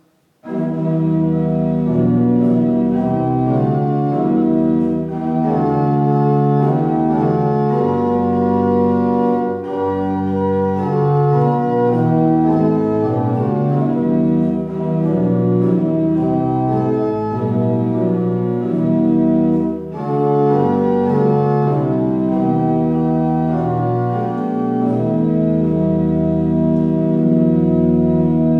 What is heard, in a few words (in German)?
Orgel, Verleih uns Frieden